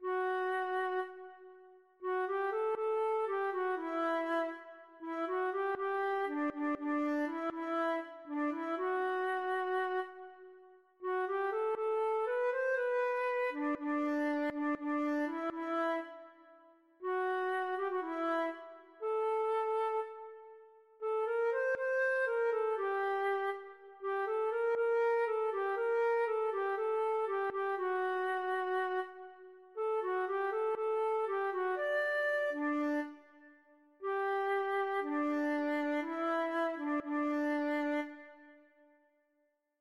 tov, feadóg

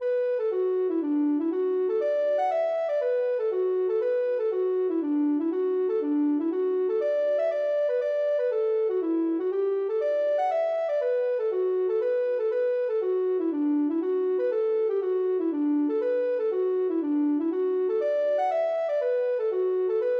ocarina

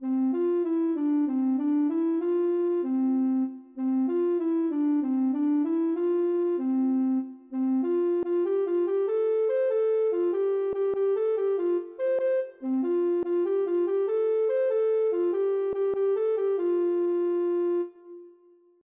ocarina

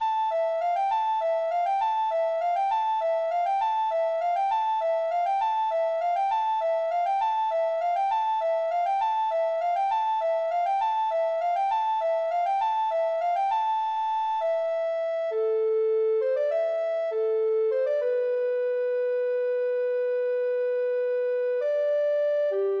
ocarina

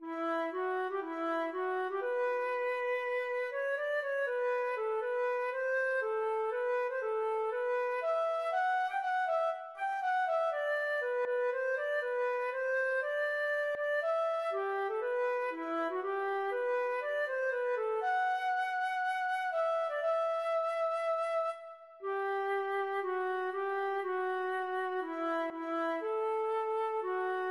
lapidim, feadóg

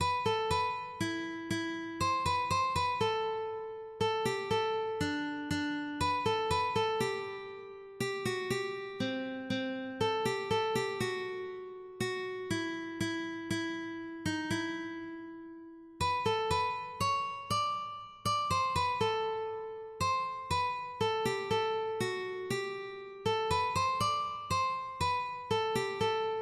buba, ukulele

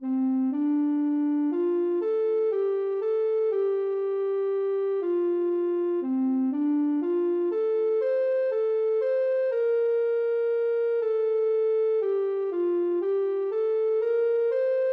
ocarina

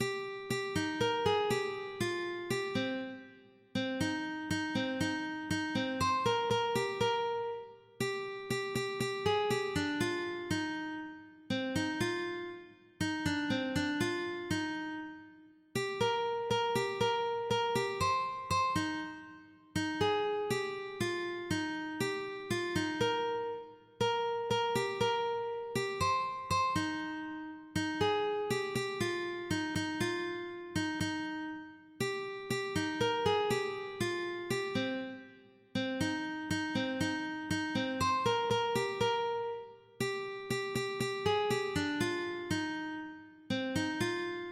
delet, ukulele

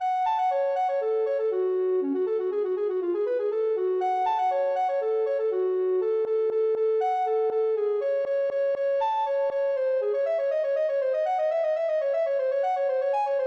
bwv1067 badinerie, ocarina

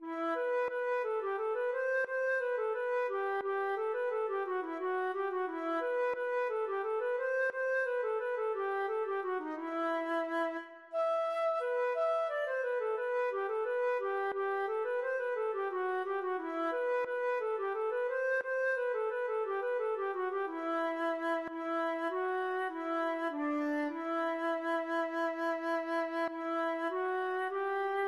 longbow, feadóg

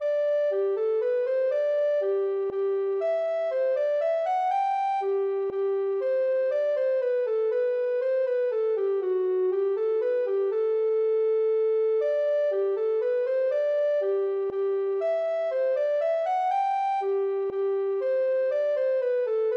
minuet, ocarina

